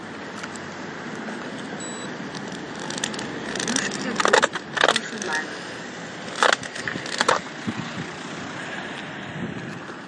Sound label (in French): bande d'éveil de vigilance métallique; machsens